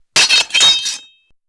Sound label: Sword On Sword Clang; Weapons & Explosions